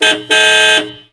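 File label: honking; troubeni; Mafie 1 cz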